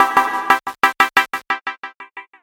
EasyArp

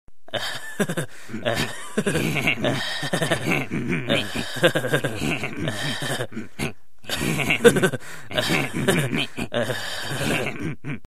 Laughing

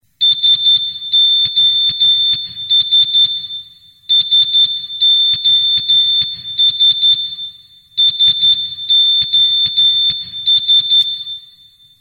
Morse Sos Sos Sos

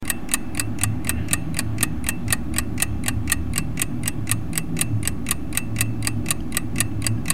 Tic Toc

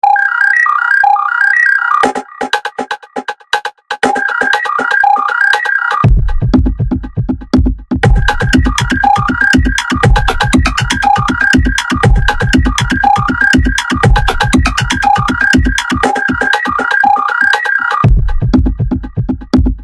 New Techno

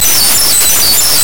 Guitar; Darr

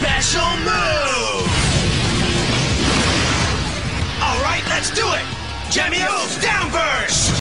Down Burst

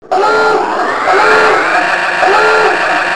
Nukelaunch

